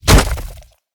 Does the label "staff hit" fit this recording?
Yes